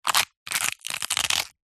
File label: horror broken bone arm leg limb twist dislocate; zapsplat